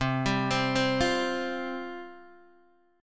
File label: C Suspended 4th C Guitar DADGAD; down; chord shape